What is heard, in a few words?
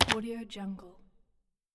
mechanical keyboard key single